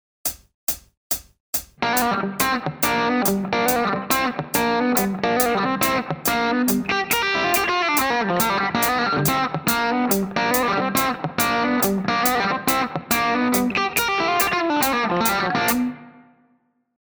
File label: lick